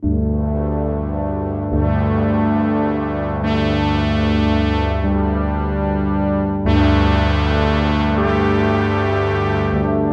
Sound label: Arturia SQ80 V ESQ1 Chroma